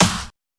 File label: subtle reverb snare single hit f key, snare drum sample tuned to the F note